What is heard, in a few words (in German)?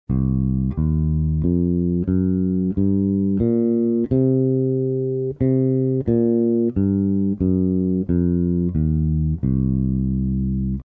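blues tonleiter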